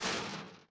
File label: chainlink, footsteps walk; boomer